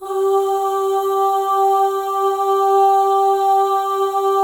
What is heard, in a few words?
OH, FEM, Symphony of Voices; Spectrasonics